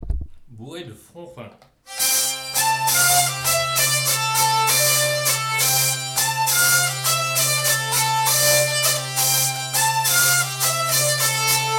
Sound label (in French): Bourrée; Fronfrin